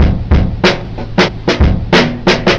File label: Drum loops, Boombap